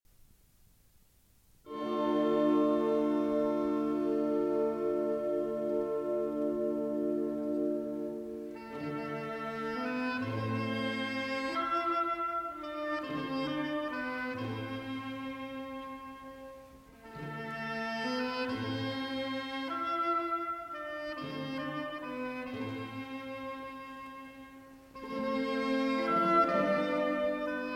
Symphony No. 4 in D Minor; Schumann Symphony No. 4 in D Minor, Op. 120 - II. Romanze (Z

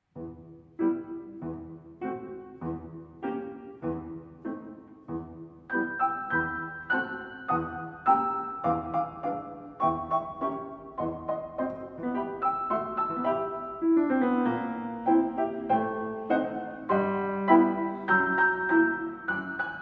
Arr. for Piano; The Nutcracker Suite, Op. 71a; II. Dance of the Sugar Plum Fairy

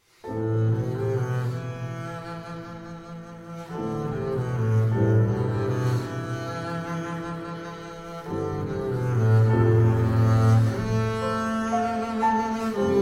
Melody (Arr. For Double Bass & Piano)

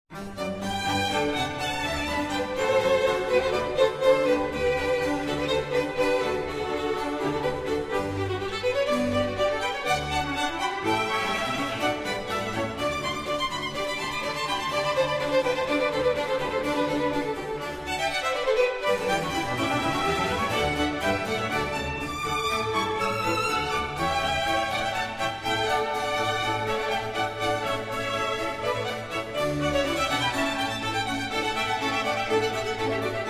Concerto for Strings and Continuo in G major

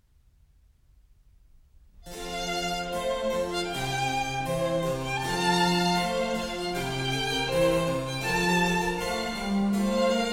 Trio Sonata in F for 2 Violins and Continuo

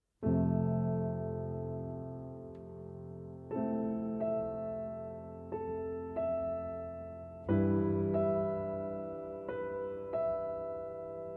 Piano Cello Version

